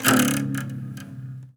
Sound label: guitar broke; Sound Design Tools; Raw Foley